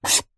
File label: warfare knife tip scrape metal surface sharp single fast; zapsplat